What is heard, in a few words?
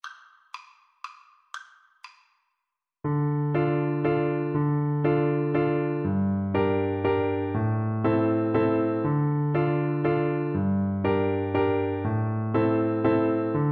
carolans, Tuba